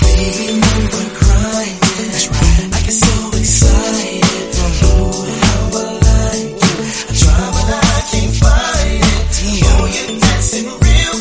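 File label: Remaster 100 bpm F minor; Redrum; remixplanet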